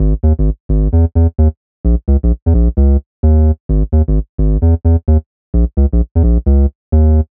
Bass Loop 130 Cm; Bass Loops 130bpm; Downtown House

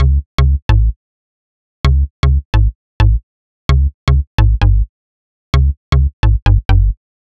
Bass Loop 130 Fm; Bass Loops 130bpm; Downtown House

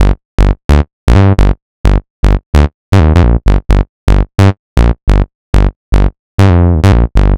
Bass Loop 130 Gm; Bass Loops 130bpm; Downtown House

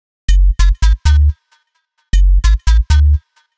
Bass Loop 130 Cm; Bass Loops 130bpm; Downtown House

